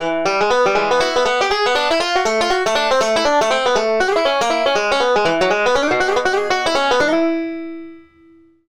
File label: Banjo; Smartisan; Smartisan OS 6.0.0; alarms